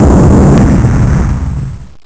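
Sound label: explosion; ARRAKIS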